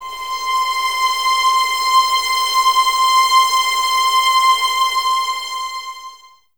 VS C 6, 11 Violins, Mute; Miroslav Vitous; String Ensembles

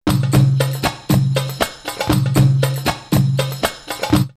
drumloops, World & Ethnic, 118; A-Team; X-Static Goldmine

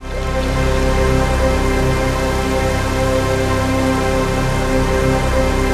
PAD, Dance Mega Synth; Best Service

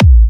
Kicks A; markus hakala

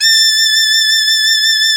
mizmar

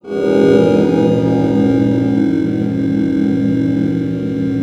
GRUNDGE BELL, rhythm loops; Rarefaction; Poke in The Ear With A Sharp Stick

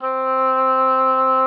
OBOE C4; Xsample VOL-6

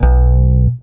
bass (B) bend; Sonic Foundry (Sony Creative Software); Ugly Remnants VOL-2; Basstuff

